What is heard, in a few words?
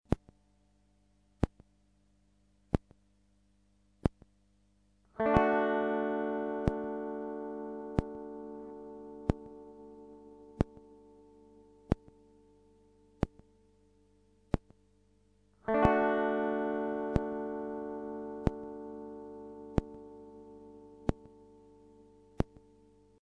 chord metro